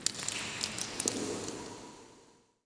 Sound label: PIPES, pipes; PC Gamer IT CD 40; zones